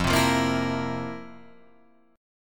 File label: F Major 7th Suspended 4th, Guitar, Open F; FM7sus4 strummed; JGuitar